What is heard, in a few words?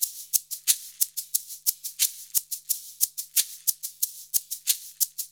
90 MIX, Percussion Loops